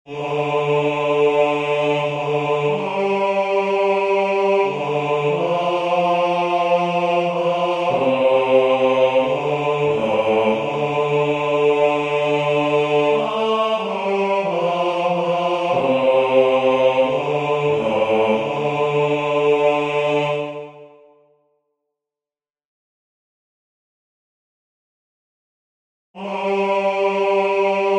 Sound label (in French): basse